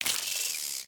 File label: Spider Attack; The Inn